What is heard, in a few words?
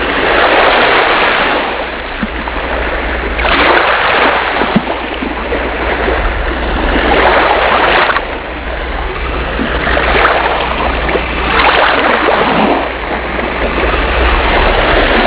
wave snd; lovenest